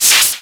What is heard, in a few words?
move; Pokemon Solar Light & Lunar Dark 1.0.1